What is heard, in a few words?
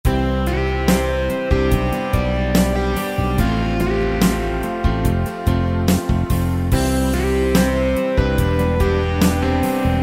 Rock Ballad; Pop & Rock